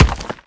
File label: stone hit; Scrat